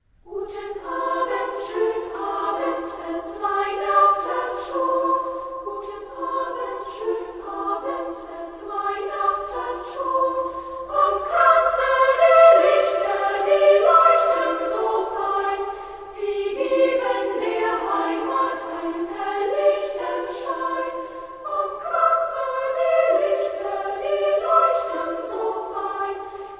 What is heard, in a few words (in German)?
Kinderchor